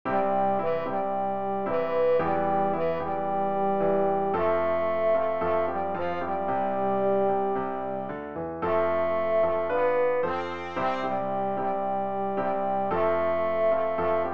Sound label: Rumba